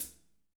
foot

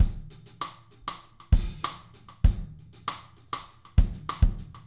98, drum

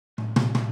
Boogaloo - Kick, 82 BPM; Drums; Intro; Sonic Foundry; Drums from the Big Room The Mixes

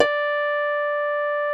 D4, synth pluck; Music Store - Claudius Diemeter Schwerlos

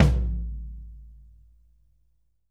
tom, drum

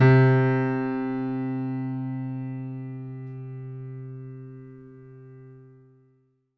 MIX R, Acoustic Piano; InVision Interactive; Miroslav Vitous

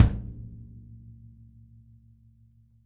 kick

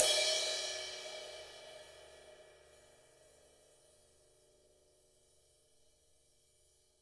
RIDE, Drums Acoustic; USB Soundscan vol.10; AKAI; 90s sample CD

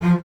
Basses F Legato, String Ensembles; Miroslav Vitous

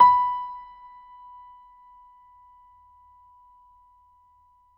MF63 L, Acoustic Piano; InVision Interactive; Miroslav Vitous

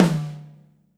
tom, Drums Acoustic; USB Soundscan vol.10; AKAI; 90s sample CD; DYNAKIT 3

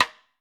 Snare Drum, 5X14

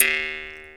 Jaw Harp Ee; Multisamples; Digital Sound Factory Planet Earth

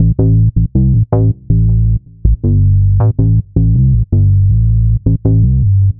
Synth Bass

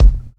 Kick, Kickdrum; Equipped Music - Loungin House

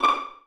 Vio Piz D5; Digital Sound Factory Virtuoso

